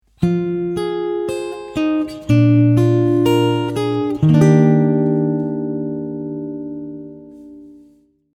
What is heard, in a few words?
first note is F